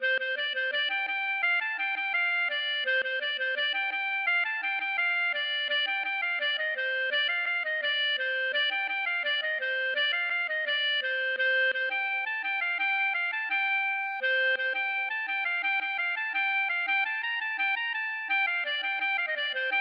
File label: gavotte; Suite Nolose 2